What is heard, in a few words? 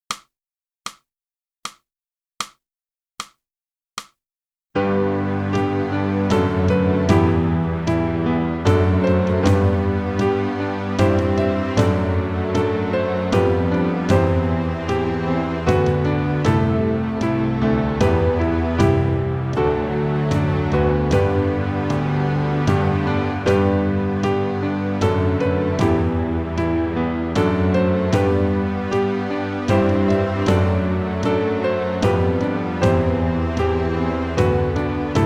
flute; Boosey Woodwind Method: Flute (Book 1)